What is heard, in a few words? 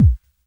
KIT, Dance Mega Drums; Best Service